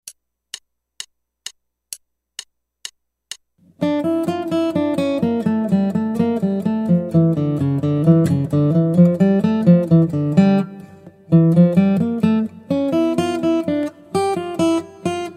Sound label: Guitar